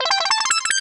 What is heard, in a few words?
fire flower